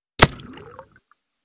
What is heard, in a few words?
Bullet Impacts Water